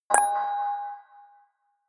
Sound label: notification signal